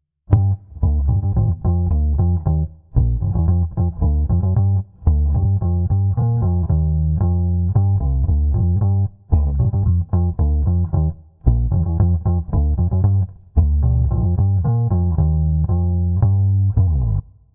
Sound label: Bass